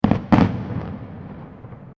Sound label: Fireworks Multiple Firecrackers